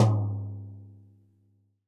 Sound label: TD-20 Tom B, Roland TD-20 Toms